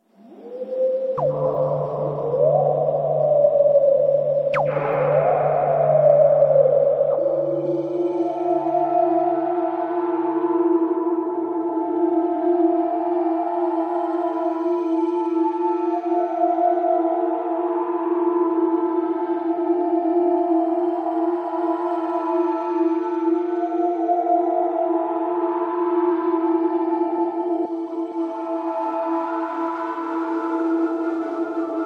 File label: noise